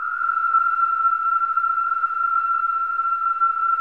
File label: WHIS, whistling; Spectrasonics; Symphony of Voices